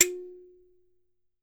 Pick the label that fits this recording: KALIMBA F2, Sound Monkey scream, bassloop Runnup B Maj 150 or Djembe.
KALIMBA F2